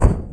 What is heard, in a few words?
flesh step, doom3 pinky